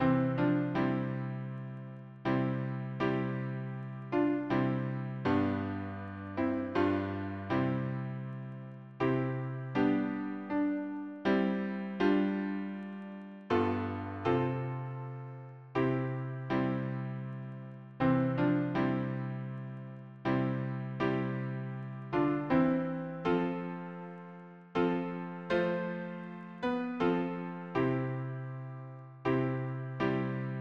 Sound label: harmony piano; hymnary